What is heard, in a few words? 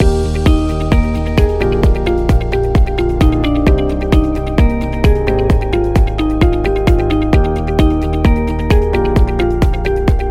Corporate Upbeat Loop; technology